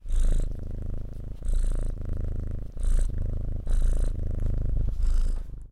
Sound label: Cat Purring Sound